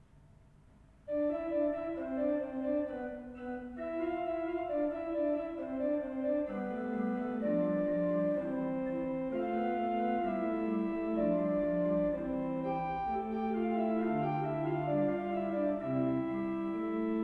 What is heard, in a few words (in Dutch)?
magnificatfuga, orgel; Noordscheschut; Pachelbel